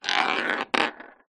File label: leisure marble glass roll wood floor; zapsplat